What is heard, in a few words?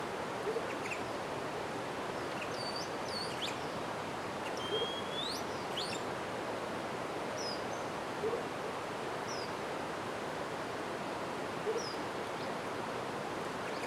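Jardin Meadows ambience exterior, Colombia, Antioquia; Pachamedias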